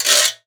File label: Cheese Grater, household rhythm samples Hits